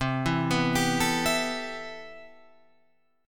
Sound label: C Major 13th C, Guitar Open F; CM13 arpeggiated